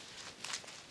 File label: dragon rider walk; propack; magic